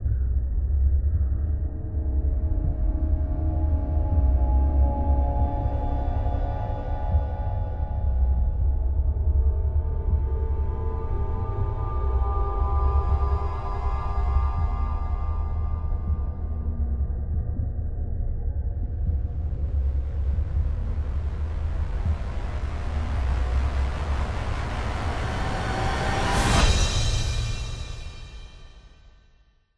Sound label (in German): Atmo Musik Gruselszene